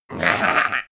imp laugh

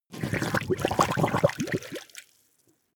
liquid slurp large

